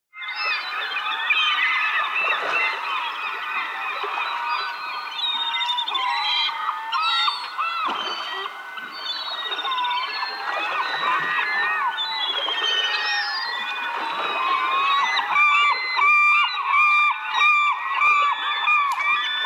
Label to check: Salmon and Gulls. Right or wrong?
Right